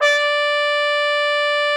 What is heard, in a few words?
TROF D5, TRUMPET; Xsample VOL-3